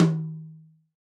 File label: tom f#5